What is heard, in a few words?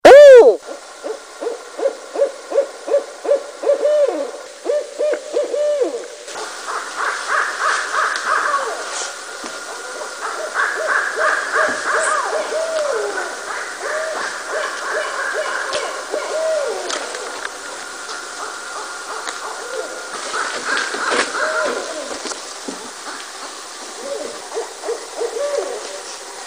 Barred Owls